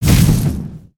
Fire On Hit; Spinjitsu

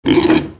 Pet Warthog Growl; creatures pets

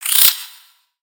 Spider Exert; creatures mechanical; Lego

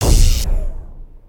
wall pushback; aronwk collection; ndaudio

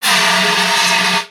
Fire Extinguisher; Daredevil

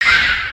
sleep, creatures organic

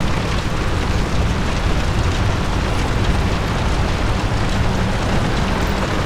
Fire, ambience spaceship

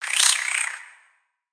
Spider Idle Bored; creatures mechanical; Lego

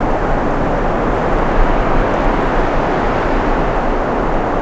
wind; avant gardens

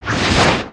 Swim, foley

